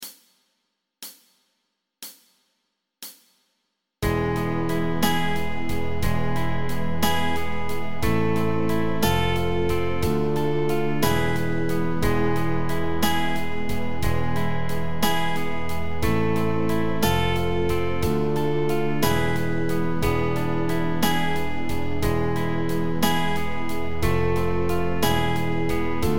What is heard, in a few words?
Folk Backing Track; Dokhtare Boyer Ahmadi